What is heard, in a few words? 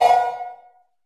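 large room 808, reverb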